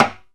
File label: lo timbale